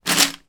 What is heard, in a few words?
office large pencil case full of pens pick up from table